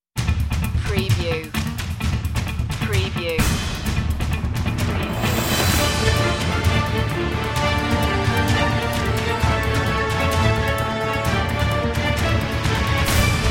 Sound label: no choir